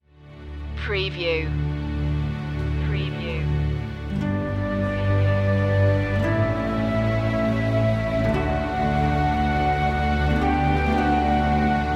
flute strings mix

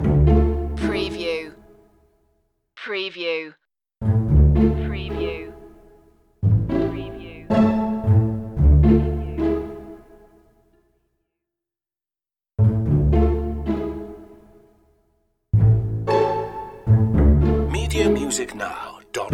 pondering strings only

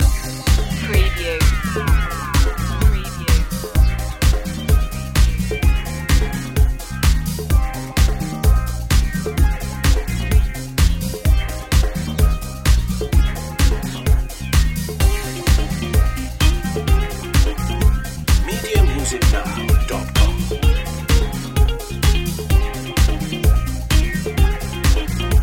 pop city loop

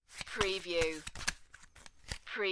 Cards Playing Cards Shuffling

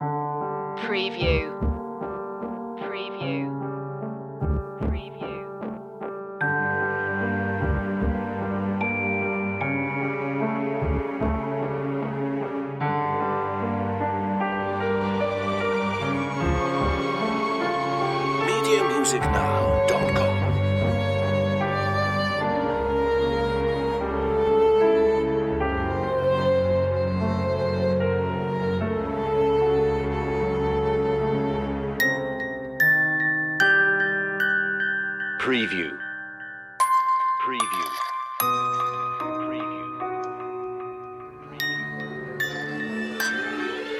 no fx no perc

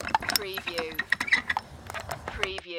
Window Open Lever; Sound Effects Bible

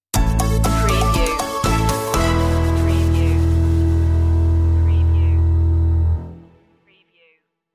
walking on air stinger

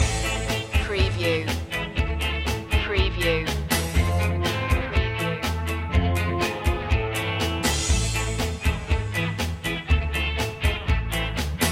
cold war ska loop

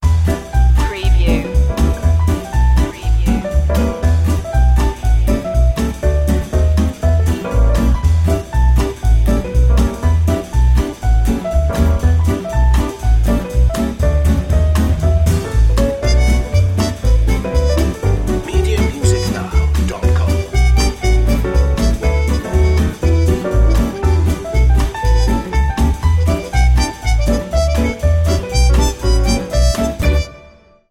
here comes that jazz

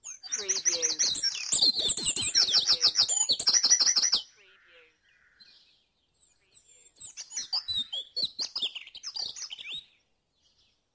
Dawn Chorus in Mold North Wales - Short Loop; beanstalk audio

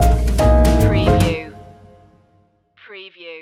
jazz stinger

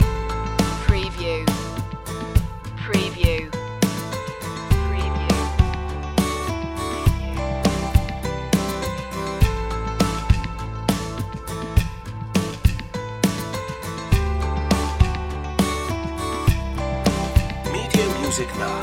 ocean breeze loop

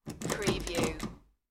Door Handle Shake

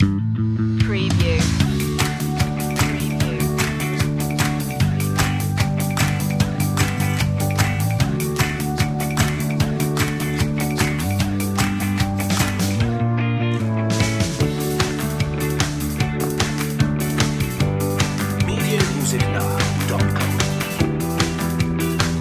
bright future inspiring

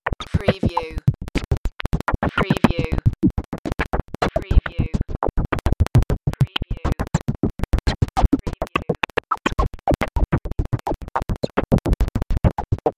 data search

